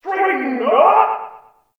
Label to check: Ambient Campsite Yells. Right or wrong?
Right